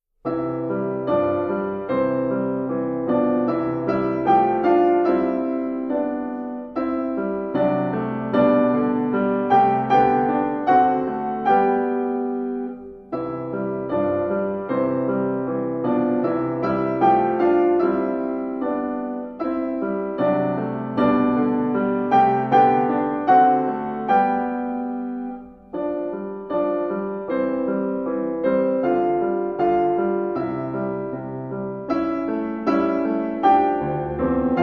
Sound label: A Clock Tower Duet